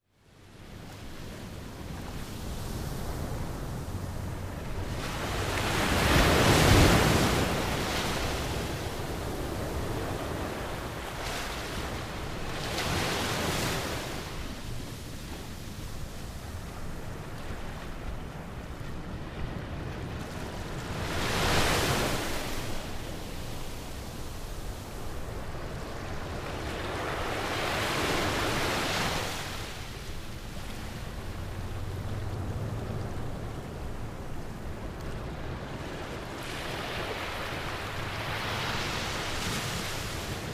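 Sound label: Medium Surf Low Rumble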